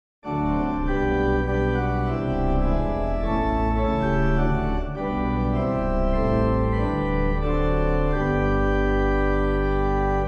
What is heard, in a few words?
organ; A Charge To Keep